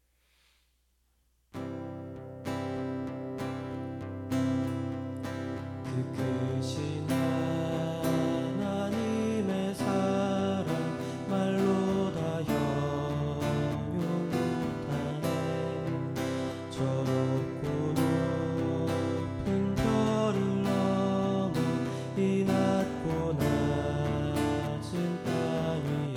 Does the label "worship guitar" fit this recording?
Yes